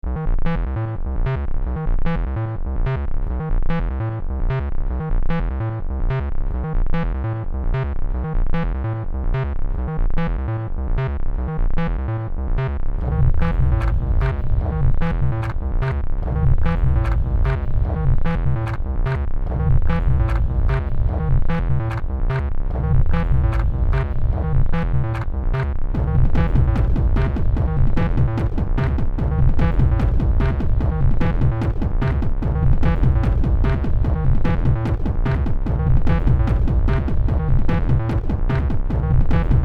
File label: sine wave